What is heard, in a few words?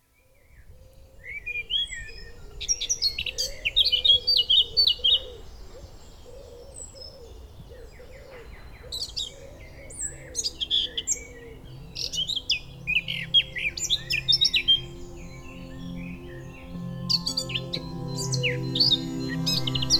Birds; Sheng Ma lodi